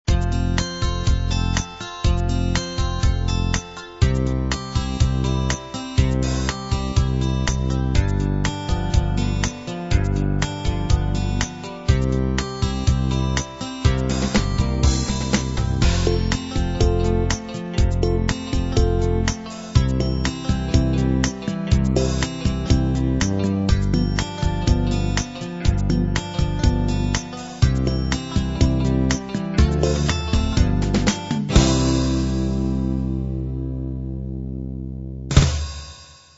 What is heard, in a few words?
pop beat